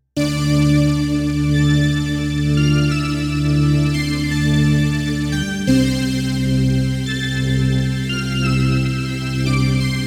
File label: Yamaha Reface YC wave a